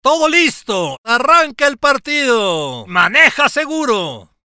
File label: Start Drive